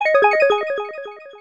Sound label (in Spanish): Whatsapp Cortos; toque de celular